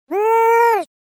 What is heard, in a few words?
happy; pet